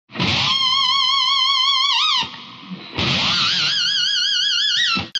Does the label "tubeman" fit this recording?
Yes